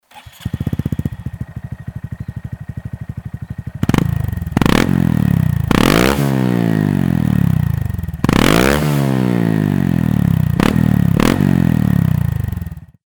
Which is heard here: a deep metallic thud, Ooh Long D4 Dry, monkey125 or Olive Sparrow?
monkey125